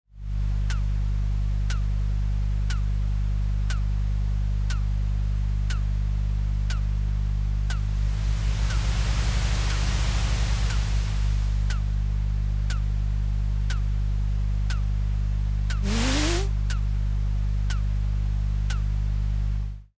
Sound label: evening room tone